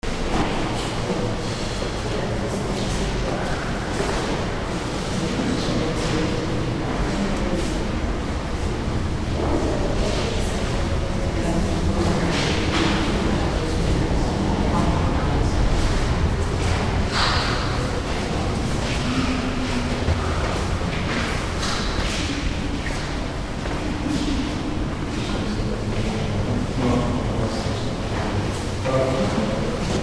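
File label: atmo germany berlin cathedral interior; berliner dom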